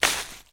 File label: foley footstep path deep gravel